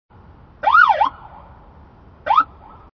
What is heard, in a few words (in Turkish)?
polis siren zil sesi kisa; cep telefonuna polis siren sesleri